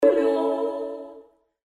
jodler in A

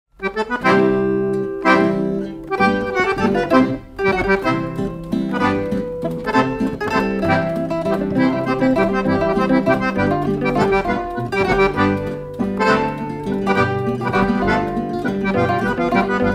Musikanten Salzburger Walzer; Rupertiwinkler

